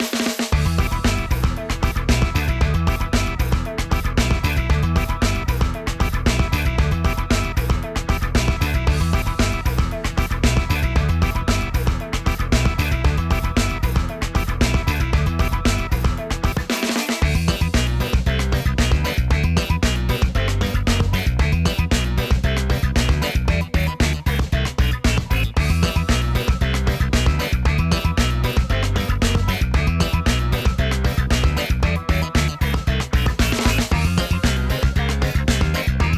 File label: Break Beats; friday night funkin vs ourple guy v3